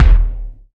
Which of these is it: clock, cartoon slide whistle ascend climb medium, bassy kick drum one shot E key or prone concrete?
bassy kick drum one shot E key